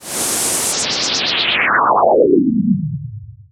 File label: Air FX, Filtered Noise